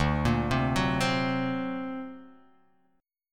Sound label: D Augmented 7th, guitar; D+7 arpeggiated; JGuitar